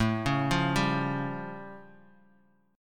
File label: A Augmented 7th A Bass Standard; arpeggiated; JGuitar